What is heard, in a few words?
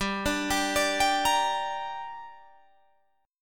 G Minor G down Guitar Drop D, chord